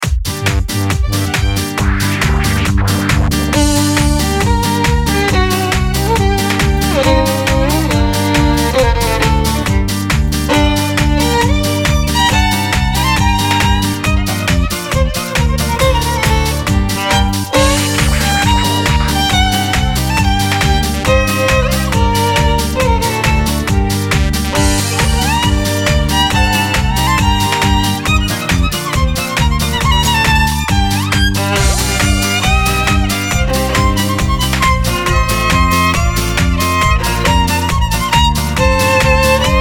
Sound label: brams vengerskiy tanets 5, violin